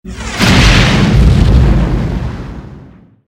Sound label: war zone big explosion